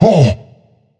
nv surprised, vox; smite; voicepack; vulcan

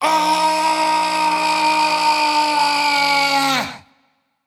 Fear; smite; kukulkan

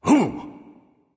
surprise; smite; Vulcan

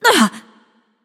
grunt hit; smite; voicepack; izanami

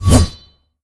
stab; Smite; god Lancelot

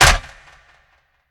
shield tap; smite; Ares

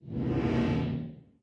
swish; smite; god thanatos

